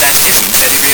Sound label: not reassuring